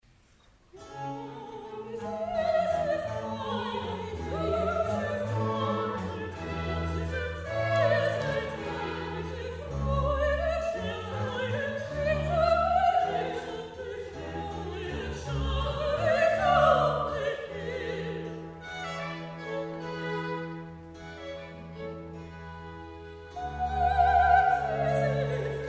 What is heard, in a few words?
Duet